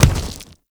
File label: Arm Pat; Enemies; Warframe; ManInTheWall